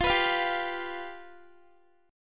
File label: F Suspended 2nd Flat 5th, down Guitar; Fsus2b5; strummed; 4E,4A,5D,5G,5C,6F